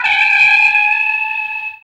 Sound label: FX Dance